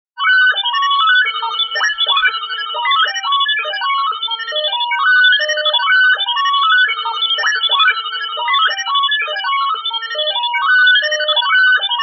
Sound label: computer